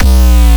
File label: bass